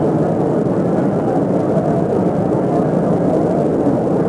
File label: Large Crowd Idle; David Beckham Soccer; XBOX